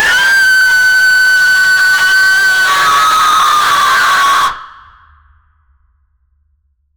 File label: sudden horror jumpscare; doll